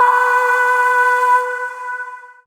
Void Shawzin Oct Two B; Warframe; Zariman

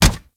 Kubrow Run Metal, Footsteps; Enemies; Warframe

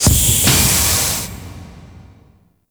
Organ Pipe Open; Ambience; Gameplay; Warframe; Orokin Moon

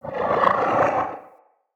Corrupted Slug Vocal Idle

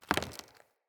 Mockery Foley Movement; Enemy; Mockeries; Characters

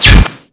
Bolt Impact Metal; Warframe; Cross Bolt

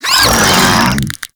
Desert Skate Vocal Attack; Warframe

